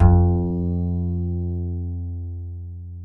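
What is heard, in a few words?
acoustic bass soft F 2, left; Masterbits CLIMAX 7 - Bass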